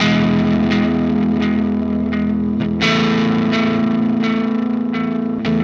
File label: Static FX Guitar